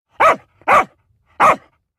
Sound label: Barking cartoon dog; پارس سگ کارتونی